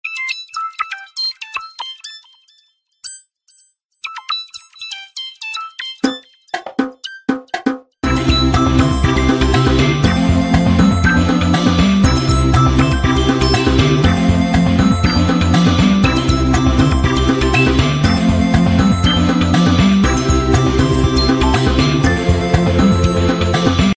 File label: reliance lg old mobile ringtone